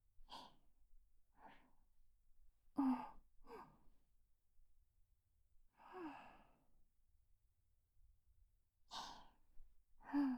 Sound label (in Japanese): 添い寝 吐息 ループ音源